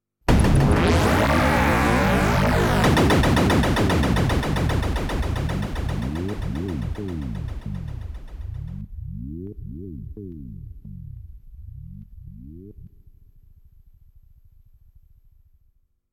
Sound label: Final Boss Death SFX; Darm